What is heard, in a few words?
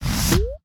Inflate